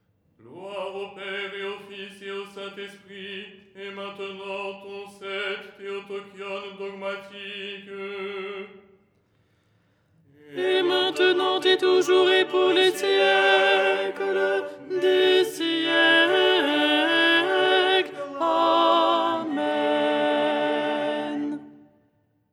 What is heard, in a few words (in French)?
alto; " Gloire… et maintenant… "; Les 8 tons en français